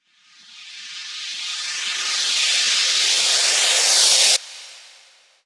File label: FX Uplifter